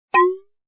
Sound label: tooltip